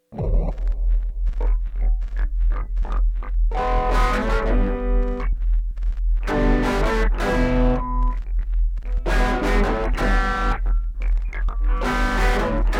intro riff; Irresponsible

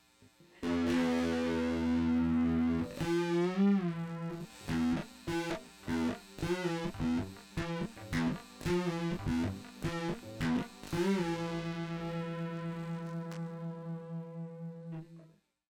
guitar; Nice Set of Lungs

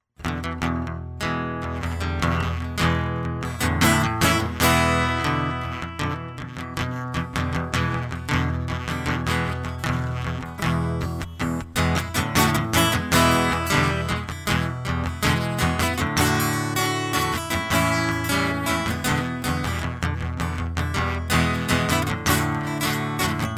acoustic

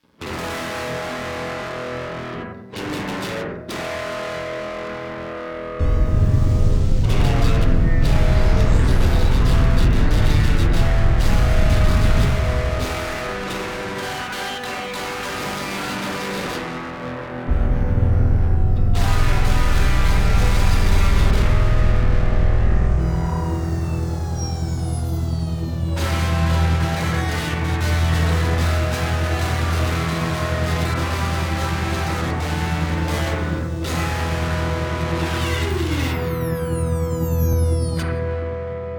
electrics

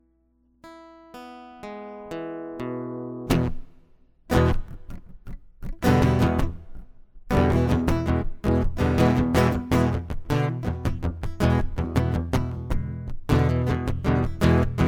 acoustic

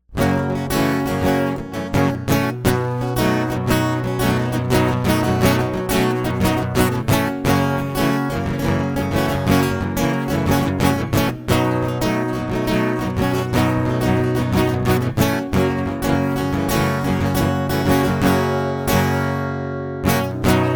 This is It acoustic

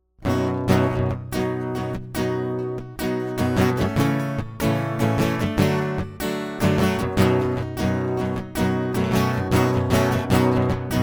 acoustic

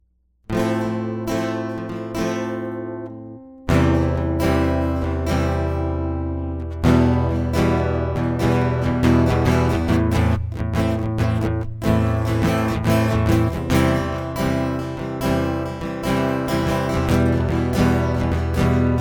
acoustic

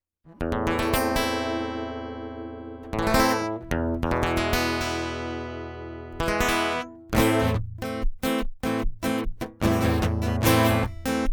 Strings Attached intro

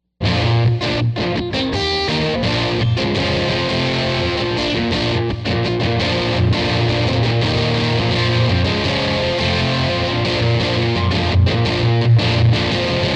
electric

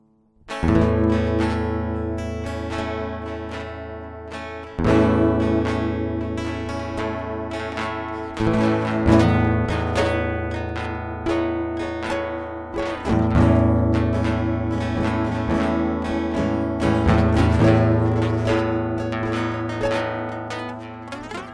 guitars

